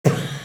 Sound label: VOCDRUM, MOUTH PERC; Best Service; Hyper Dance